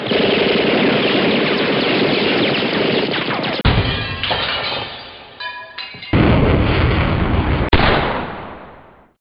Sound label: machinegun